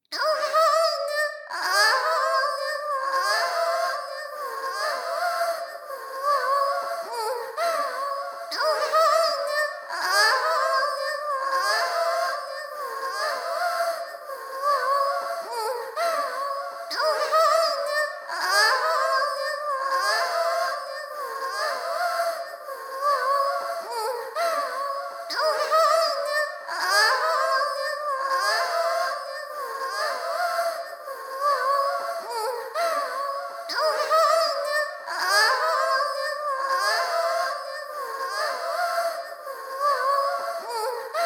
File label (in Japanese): 喘ぎ声; 喘ぎ声にしこらされる; マゾ男性向けボイス詰め合わせ